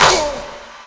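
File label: CLAP right; USB Soundscan vol.33; AKAI; Disjointed Textures; DRUMATIO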